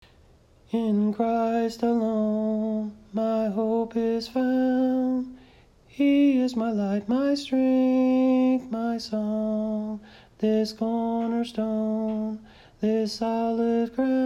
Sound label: TENOR